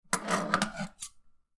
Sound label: Scissors Take; don t be afraid the first toy